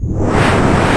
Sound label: NOISE; AKAI S6000 CD-ROM - Volume 1; SAMPLE WAVE MIXING 2; NOISE&SE